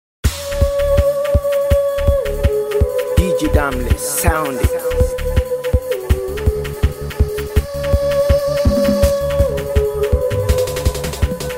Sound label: Free Beat